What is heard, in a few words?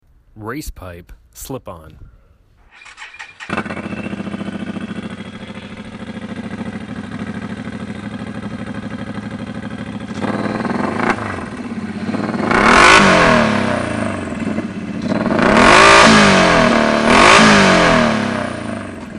can am maverick turbo all systems